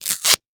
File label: Emergency Band Aid Ripping Off Patient Quickly; emergency effects; AdobeCC